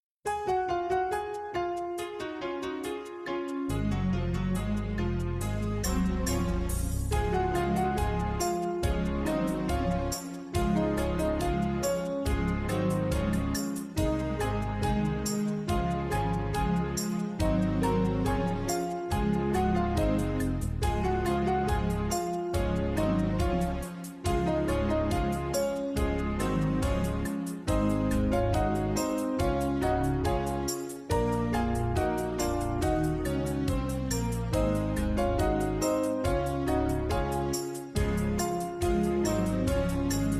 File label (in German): instrumental; Froehliche Weihnacht; ministranten